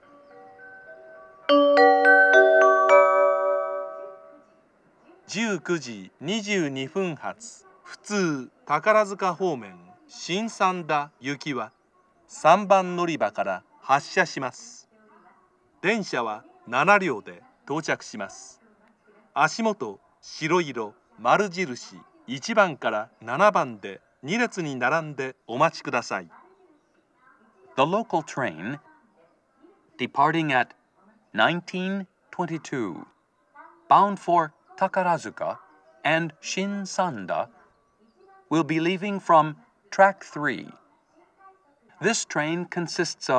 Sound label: local shin sanda, amagasaki; station; jr-west